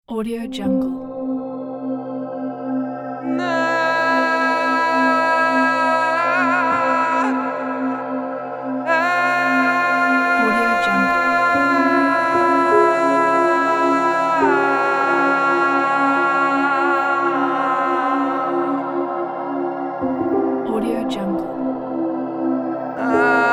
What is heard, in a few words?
middle eastern ambient vocal